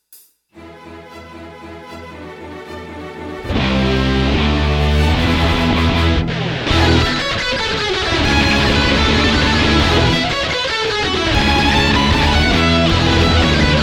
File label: Drum Minus Track